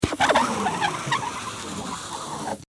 office klebeband

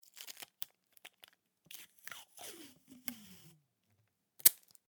Klebeband

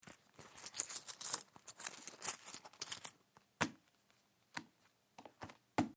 Portemonnaie Geld Entnehmen

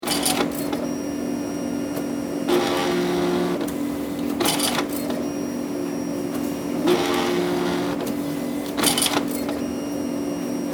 Kontodrucker drucken

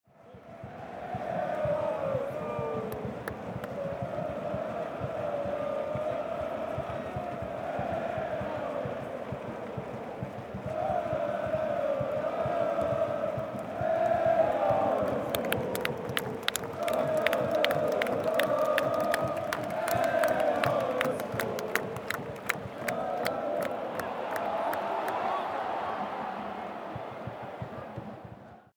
Stadion Fan und klatschen

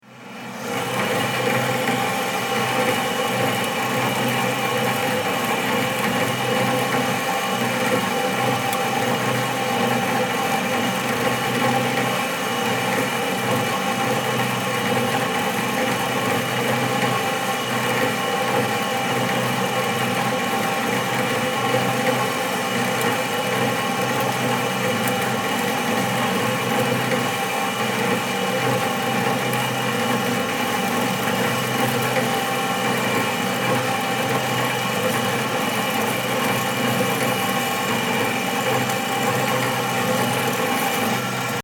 Saegen

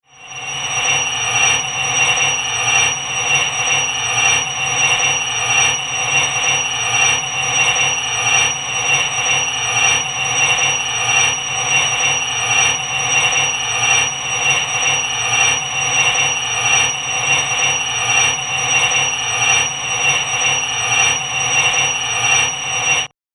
Ei auf Glas und zurueck

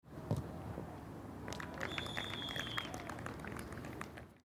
Fussball Jungendtraining Applaus

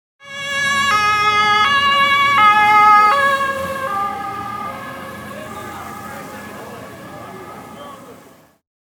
Polizei

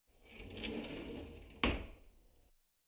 In Der Kueche Schublade Schliessen

